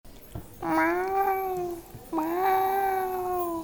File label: miauuuuuu